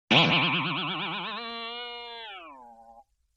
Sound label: Boing Box